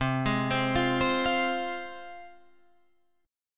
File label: C Suspended 4th Sharp 5th, Guitar, Drop D; down; chord shape